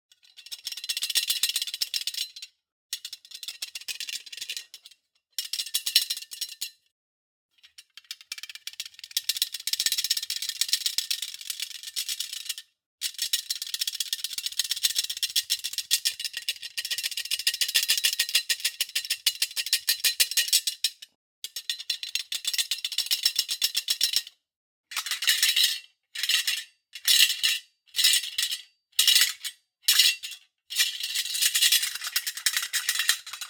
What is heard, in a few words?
DRAWER RUNNER SLIDES shake short medium long; Antisample; Shakes and Rattles